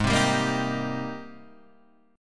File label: Ab Major 9th Ab